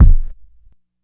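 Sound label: classic hip hop Thin kick; STYLE KITS (Hip Hop, Etc); Classic Hip Hop Mega Kit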